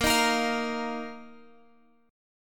A sharp Suspended 4th Guitar Open G

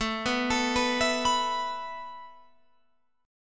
A Suspended 2nd A Guitar Open F; Asus2; arpeggiated; 21,18,21,18,19,18

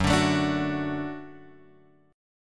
F sharp Minor Sharp 5th, Guitar, Drop D

